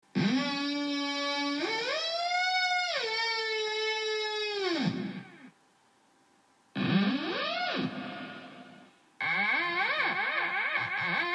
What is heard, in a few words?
Theremin